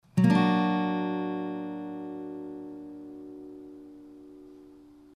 Daug, chords, guitar; The G Chord Shape

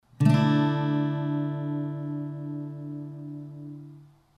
Dsus2, chords, guitar

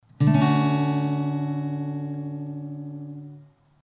D maj7, guitar chords

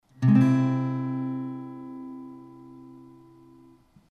C aug, guitar chords

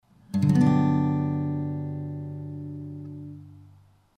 Gmaj7, chords, guitar

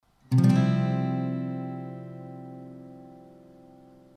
C sus4, chords

